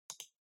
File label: PC Mouse Far L